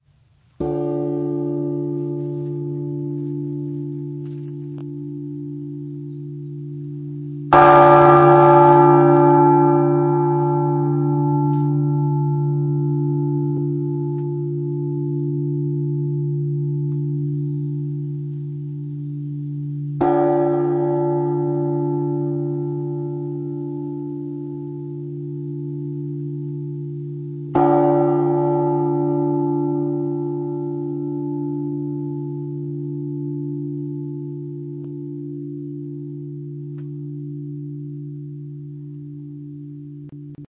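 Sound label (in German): Tonaufnahme d1 1760kg, mehrstimmige geläute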